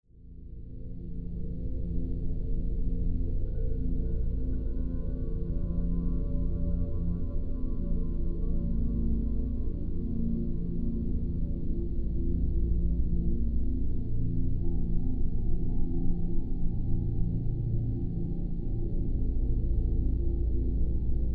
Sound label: Gamma Meditation Scientific Sounds Meditation Music